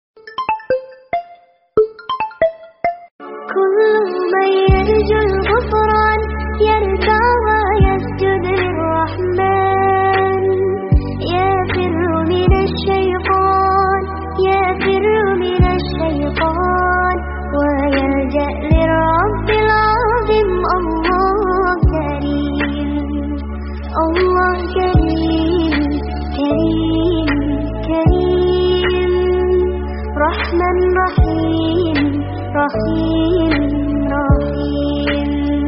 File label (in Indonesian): Nada Dering Islami; Versi Samsung